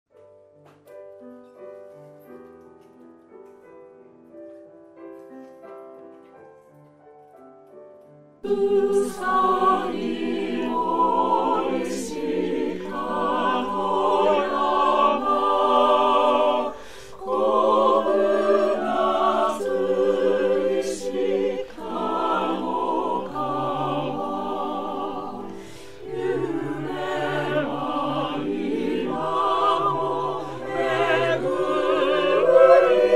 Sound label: chorus